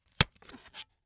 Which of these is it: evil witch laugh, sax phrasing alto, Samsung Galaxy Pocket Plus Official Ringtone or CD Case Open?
CD Case Open